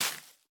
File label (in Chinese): 孢子花破坏声